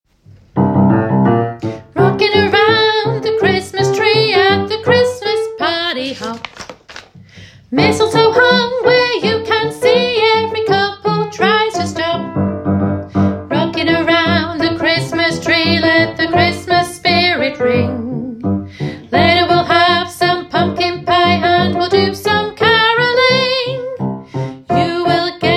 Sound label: alto